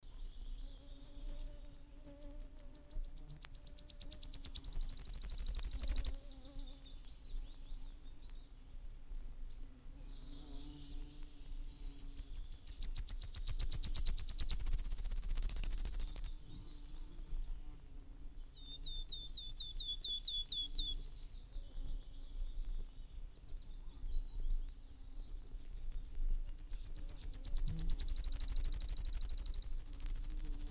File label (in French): siffleur, les pouillots